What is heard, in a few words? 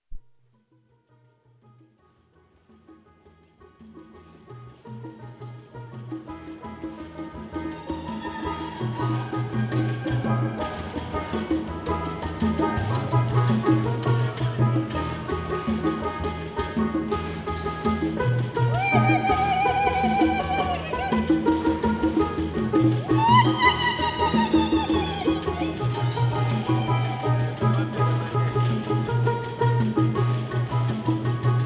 church song